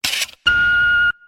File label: Card Unlock; RE2